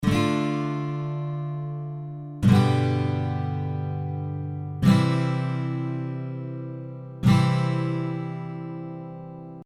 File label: Gt DGCC